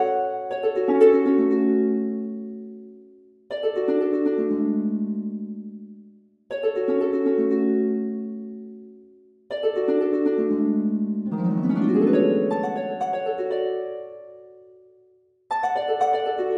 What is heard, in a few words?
harp; playful waltz